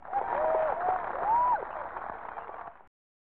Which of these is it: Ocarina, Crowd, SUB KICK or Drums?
Crowd